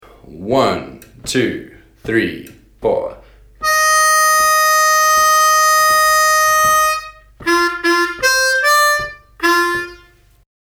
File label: Lick, harmonica